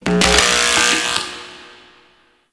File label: Corpus Infested Light Flicker; Warframe; Ambience